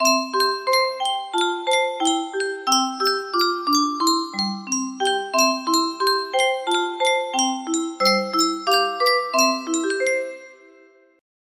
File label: Sankyo Custom Tune Music Box